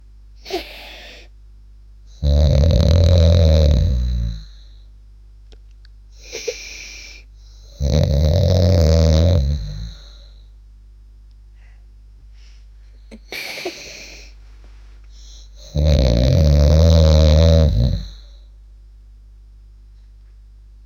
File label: 5 years old boy, sleep